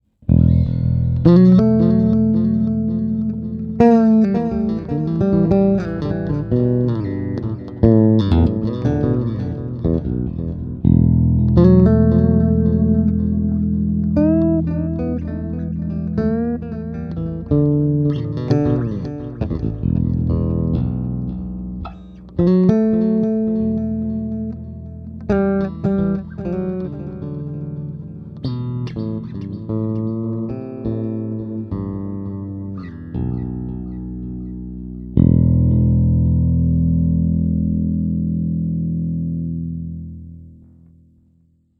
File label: Delay